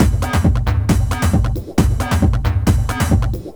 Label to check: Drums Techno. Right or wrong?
Right